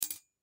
Shopping Basket, Shopping Basket Metal Handle Drop; Foley; Stores and Malls; Blastwave